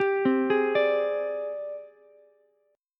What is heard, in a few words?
G sharp Major Sharp 11th, Ukulele C6; G#M#11 arpeggiated; JGuitar